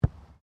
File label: RUGBY BALL BOUNCE; Various rugby sounds; Miscellaneous Sports (Sound Effects:Sports)